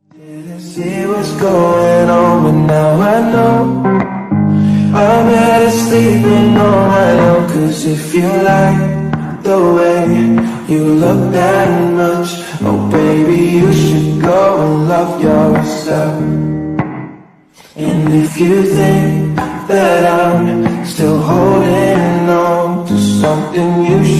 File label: slowed english ringtone